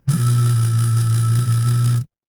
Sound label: office phone vibrate